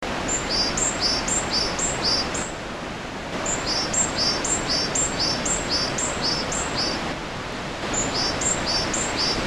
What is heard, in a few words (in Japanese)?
yamagara